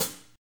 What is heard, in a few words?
tamed high hat sound a key; hi-hat